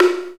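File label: African log, ambient bala; Roland LCDP11 Africa VOL-1; Bala & Xylo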